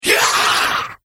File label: demonic hit